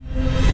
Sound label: Win low Start; netent